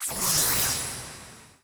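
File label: Battle Card Come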